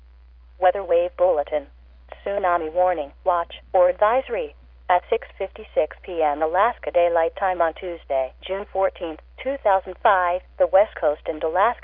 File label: tsunami warning